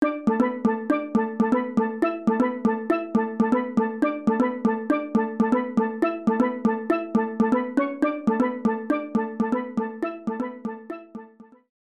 interlude; phone; fruit factory

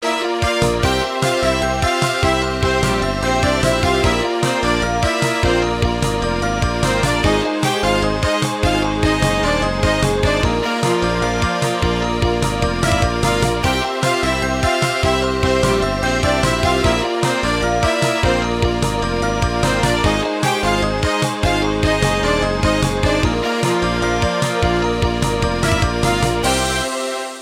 title & menu; phone

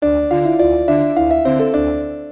jingle fantasy; phone; fruit factory 2

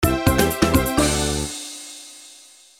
level completed; phone; beat the mole

